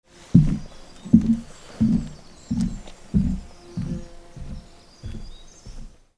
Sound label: FOOTFALL